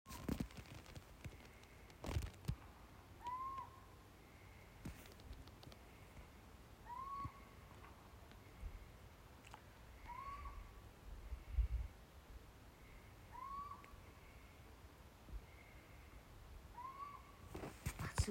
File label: Call